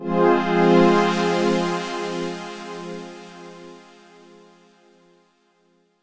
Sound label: F minor, Chords; Electronisounds